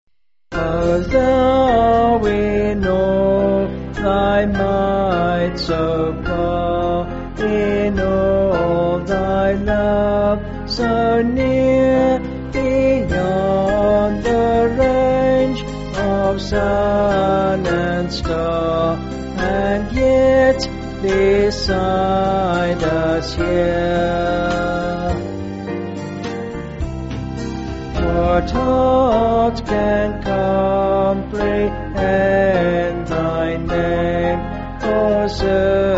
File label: O Thou In All Thy Might So Far, Crowle; Vocals and Band; Sung Lyrics